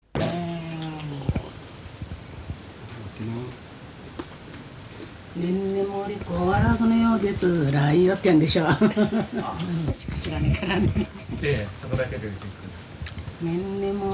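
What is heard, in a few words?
lullaby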